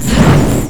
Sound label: glow eyes